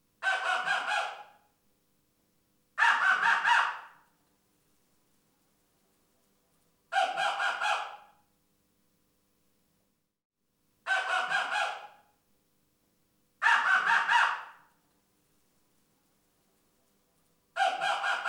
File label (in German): Ranzbeller Bellaut Rüde, stekani lisaka a lisky; Vabnicky zvuky